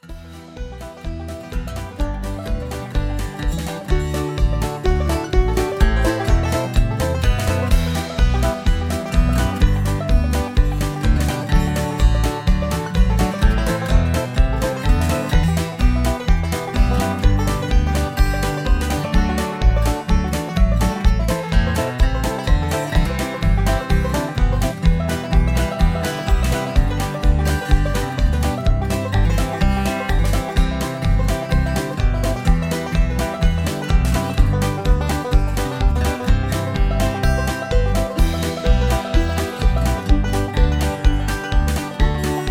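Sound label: Instrumental with background vocals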